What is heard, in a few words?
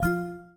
paytable Button; netent; twinhappiness